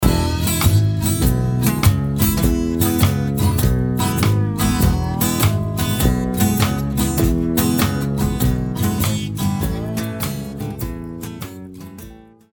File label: acoustic blues